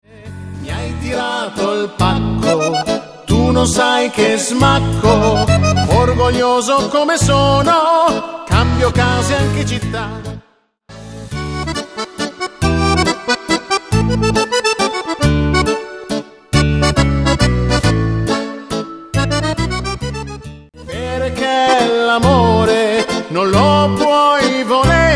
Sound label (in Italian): Mix Mazurke